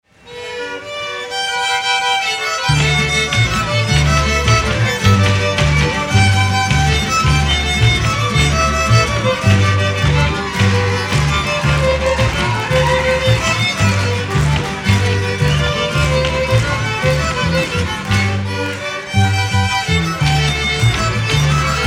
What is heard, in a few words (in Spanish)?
jarabe del gallito jarabe, mariachi infantil; mariachi trad. infantil de tonala jal